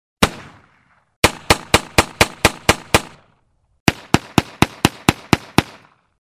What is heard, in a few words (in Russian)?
zvuk vystrela